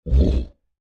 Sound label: Creature Large Grunt Effort Huff; Monsters; Horror; Big Room Sound